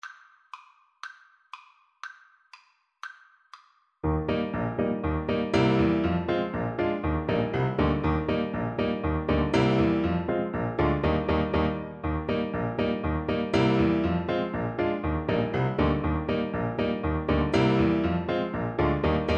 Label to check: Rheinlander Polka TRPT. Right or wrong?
Right